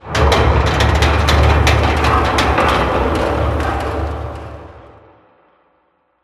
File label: ambient industrial metal ticks